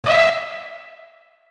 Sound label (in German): hupe